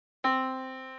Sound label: yamaha Disk